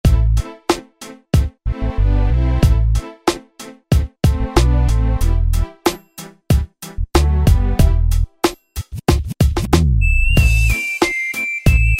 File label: Classic Hip Hop, Yamaha PSR-3000 dance style; Factory Styles